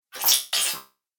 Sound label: Droid Wet, robot